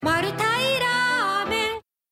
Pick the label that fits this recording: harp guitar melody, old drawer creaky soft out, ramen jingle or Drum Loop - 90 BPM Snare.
ramen jingle